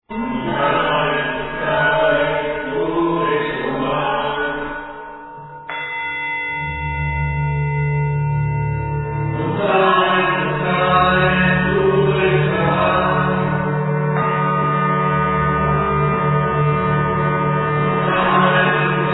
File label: TARA MANTRA